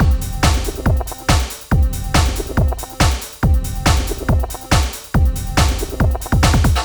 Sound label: 140 C trance kit, Drum & Perc Loops; Trance Explosion Vol1